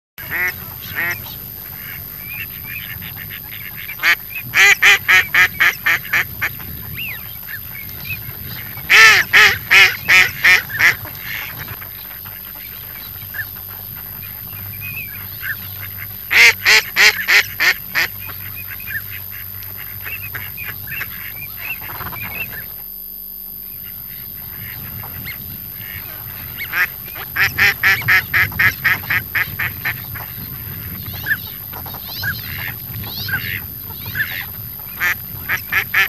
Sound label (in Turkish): ordek sesi yesil bas